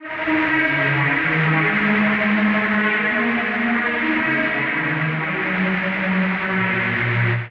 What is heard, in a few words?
D# Minor 128 bpm, pads; MIDI Clips; Tonal; Heavy Weather; Skitter and Step; Ableton Factory Packs